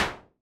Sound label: splatter splat; cake murder adventure